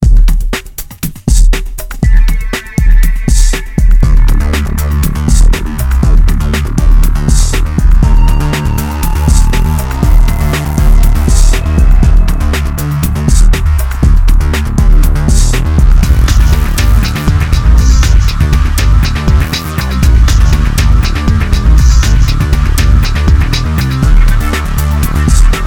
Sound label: Music Menu